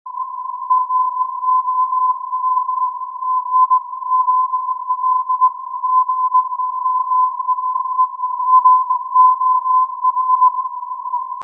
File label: 1484KHz filtered